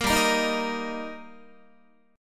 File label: G Minor Sharp 5th G Guitar, chord; strummed; JGuitar